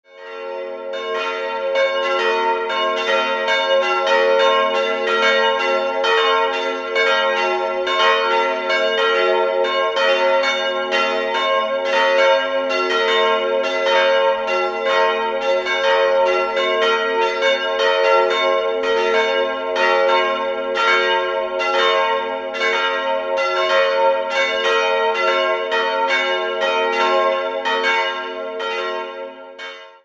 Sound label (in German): Thundorf, Glocken, Filialkirche St. Willibald; die kleine ist ein Werk von Matthias Stapf und entstand 1783 in Eichstätt.